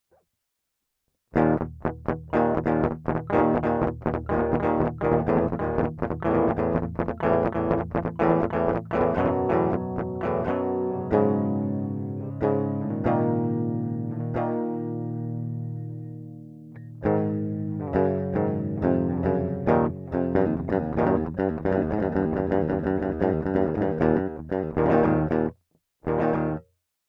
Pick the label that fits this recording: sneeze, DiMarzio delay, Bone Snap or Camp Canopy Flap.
DiMarzio delay